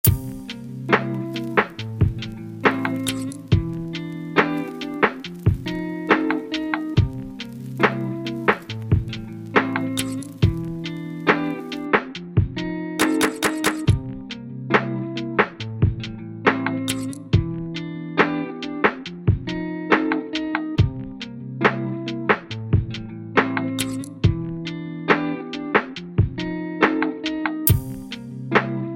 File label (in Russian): биточек для нежности инстр